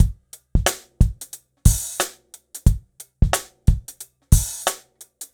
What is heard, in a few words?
090 HipHop Live Drums; Digidesign; Pro Tools; Pro Tools Essential Loops